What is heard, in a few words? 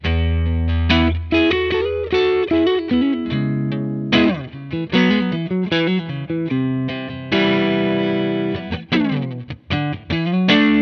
Hazey Amp; se electronics vr2; میکروفون استودیویی و حرفه ای اس ای الکترونیکس VR2